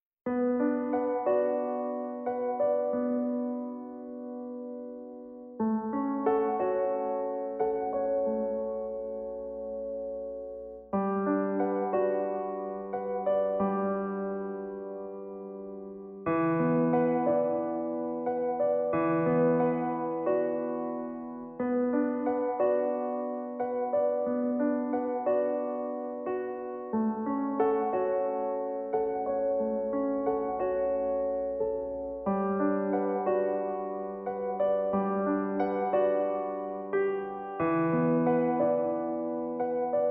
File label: SOLO PIANO